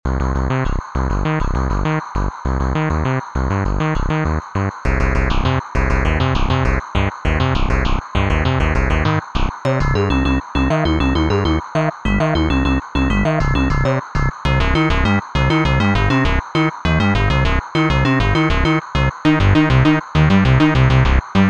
Arturia Pigments 3 Harmonics Utility Bass Variants